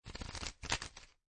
Cards Playing Cards Flipping Through

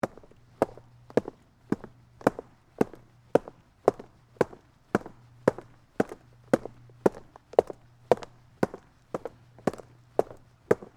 Boots Plastic Tile, Walk; Footsteps; BlastWave

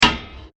Short Metal Impact; Bursts; Impacts; Crafted Signals

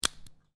Lego Pull Apart Two Lego Pieces; Miscellaneous Toy Sound Effects

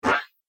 Droid Jump Sound, robot sound effects

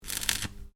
Welding Arc Short, Arc welder; Welders; Machines; SoundEffects-Foundation4

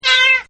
Cat Meow; Animals; Partners In Rhyme

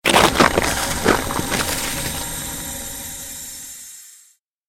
CREATED MAGIC SPELL FREEZING TOUCH; A series of magic or arcane spell sounds designed to suit fantasy themes, Arcane,Created,Fantasy Magic,Ice Magic

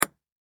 Ping Pong Ball Hit Single; Sports

